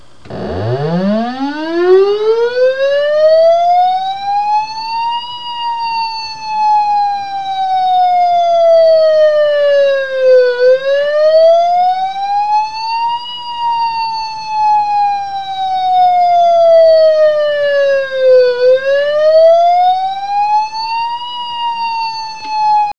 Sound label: wail; Surface ship & Submarine - E3D2 Electronic Siren (Multiple purpose IC/SM switchboard indicating ALARM)